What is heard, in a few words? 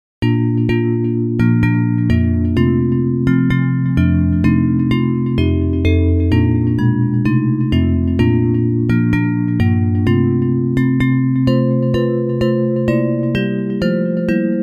Bells; Hymns